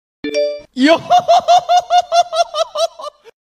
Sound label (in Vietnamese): am thanh thong bao yhohohoho; nada notifikasi Yohohoho; Messenger; nada dering ponsel